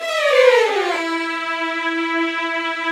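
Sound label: down, violins, runs; Roland - String Master Series; Vls Runs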